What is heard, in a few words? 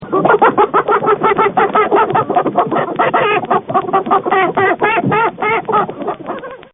Hen, Birds; Animals